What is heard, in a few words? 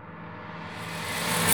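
reverse Light Bash, Cinematic Metal Impacts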